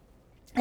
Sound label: Birds